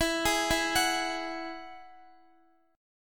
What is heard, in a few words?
E Major Add 9th, Guitar; x,x,16,13,16,14; 4F,4A,5C,5G,5C,6E; arpeggiated